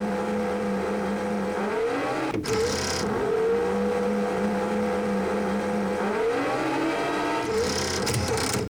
servo, tension; Total Control - Action Cutz

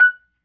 F#4 PERC, B3

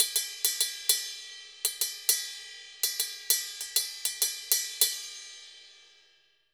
Ride Bomba 100, Drums & Percussion, 100 bpm; Best Service; Festa Latina VOL-1

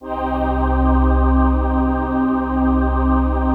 PAD, Dance Mega Synth

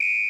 727 whis, TR-727; Hollywood Edge; The Big Bad Beatmachine

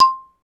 MLT XYLO

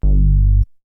Bass; ZERO G - Datafile DISC 1

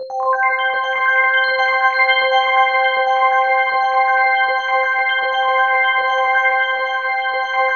BELL ARPS; Zero-G Ambient 1

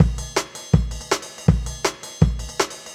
Jungle Breaks; Original Jungle Breaks

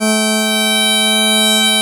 BP BASS G2, Celtic; USB Soundscan vol.42 - Celtic Flavours; AKAI

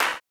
CLAP, blues & soul; ILIO; Vocal Planet

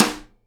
SIDE STICK, Live Snares

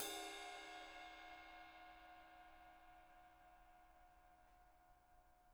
RIDE, RIDE CYMBALS; Spectrasonics - Backbeat

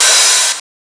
SUPER O HAT, Drums; Distored Reality 1&2

